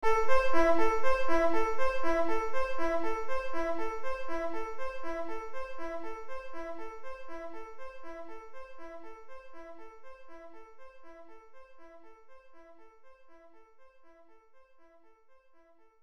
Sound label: arp